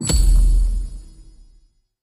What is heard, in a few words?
pot Lamp; mercy of the gods